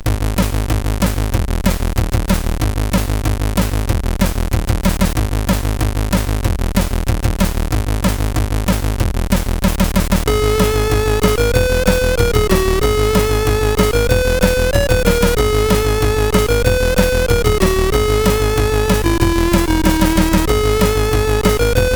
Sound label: sid, CSG8580R5; Rorschach